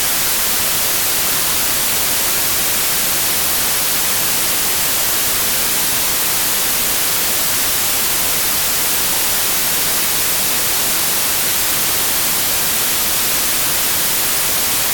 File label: White noise